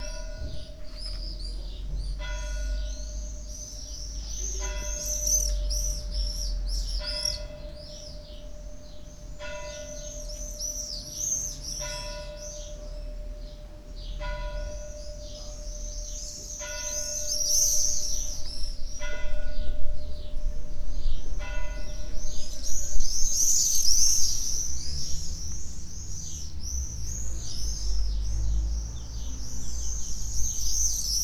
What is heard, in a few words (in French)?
Horloge Midi; Arpaillargues